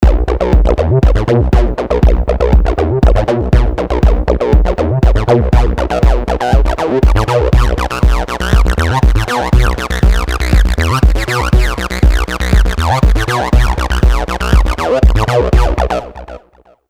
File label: Psycho Acids